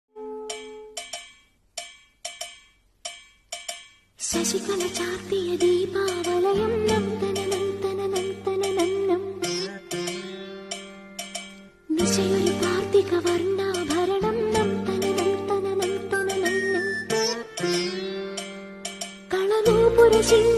song malayalam mixing; modiji; pathanjali

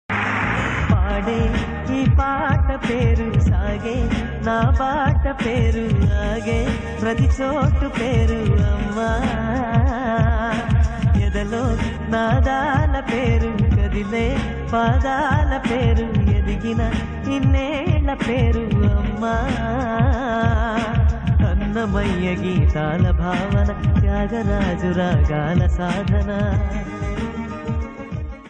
lyrical song

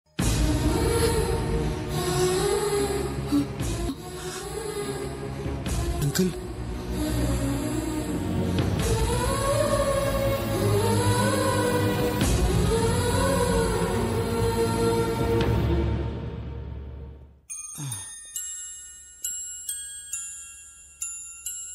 sad bgm; nagarjuna

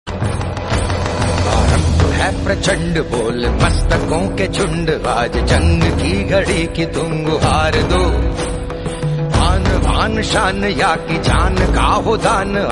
attitude ringtone

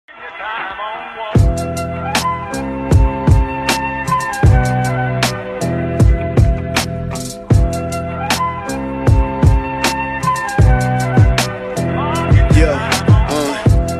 freestyle x ringtone bgm remix

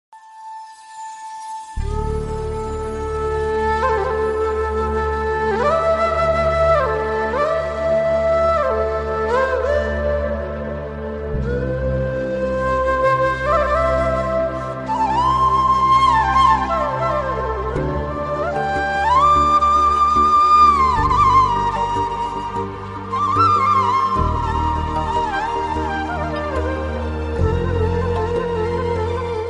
cartoon flute ringtone; lord little krishna

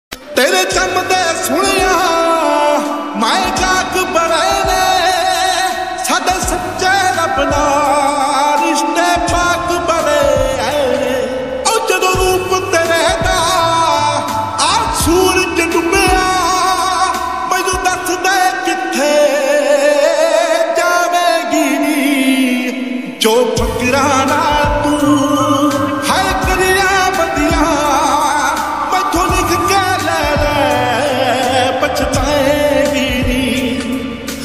punjabi song ringtone

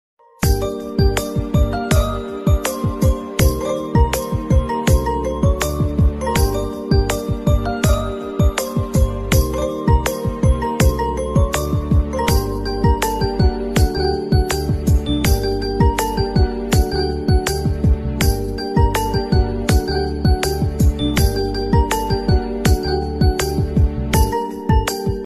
kannada sad feeling tune; gilli

